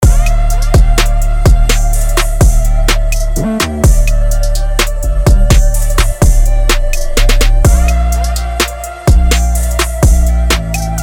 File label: Trap